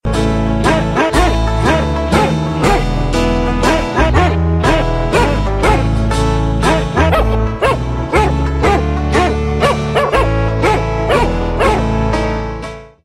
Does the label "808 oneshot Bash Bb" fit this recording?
No